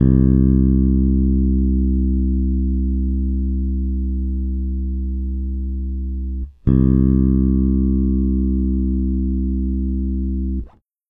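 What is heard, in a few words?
Country Bass Breakdown; Digidesign; Pro Tools Essential Loops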